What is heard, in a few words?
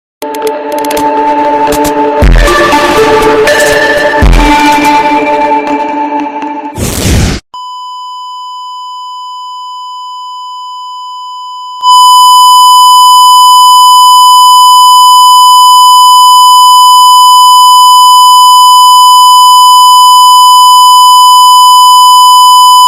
Loudest Noise Ever